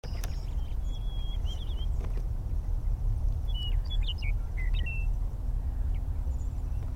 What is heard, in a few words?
Little birds in thicket